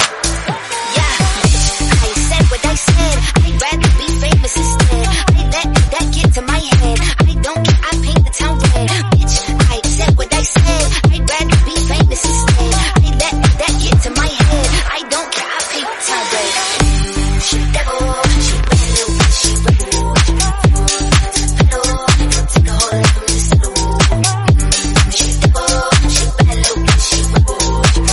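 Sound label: Tech House 124bpm D minor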